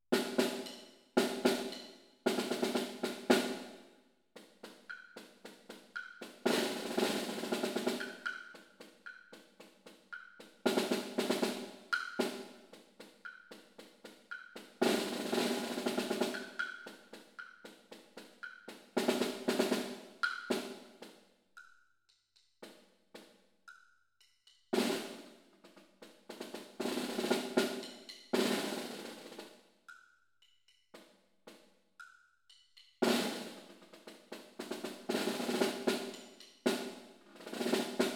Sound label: Drum effects